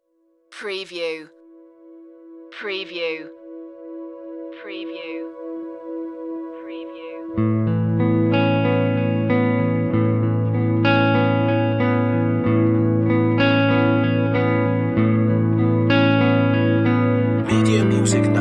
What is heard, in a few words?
guitar dreams